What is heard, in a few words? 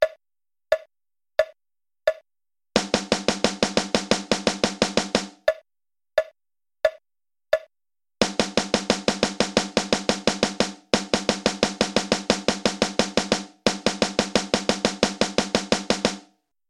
For Snare Drums Only